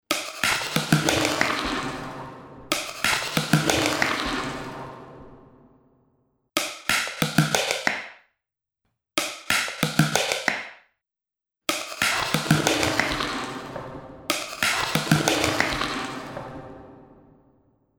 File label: Drum Sticks Percussive Dance; Preset: Percussive Dance